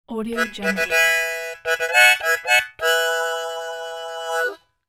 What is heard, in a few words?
harmonica fanfare; sound ideas